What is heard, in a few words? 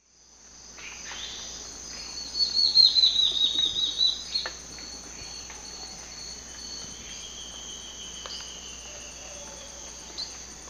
Sclerurus rufigularis, song; S. America; Eastern Lowlands